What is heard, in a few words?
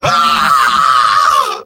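engineer pain critical death; tf2; mvm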